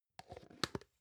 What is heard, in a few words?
plastic can small lid close soft